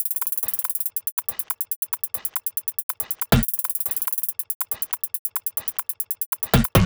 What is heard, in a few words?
140 Tops